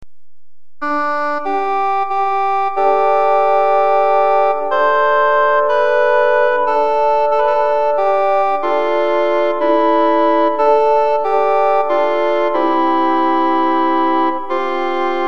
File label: chechen folk music